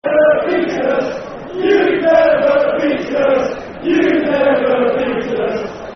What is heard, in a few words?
Football Chant; You've Never Beaten Us; Cheltenham Town Ctfc